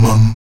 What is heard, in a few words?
VOX MUM A 2B, Afro Chants; Roland LCDP11 Africa VOL-1; Stacc Mums